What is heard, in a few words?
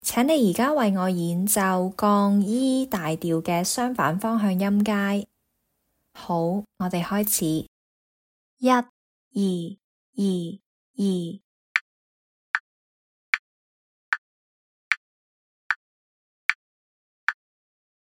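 E flat major contrary motion scale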